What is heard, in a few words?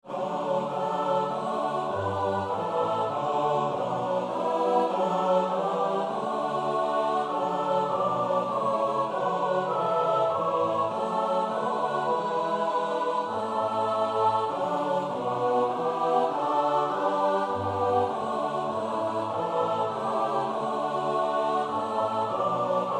S-A-T-B; Bastiaans (Johannes Gijsbertus); Liedboek 462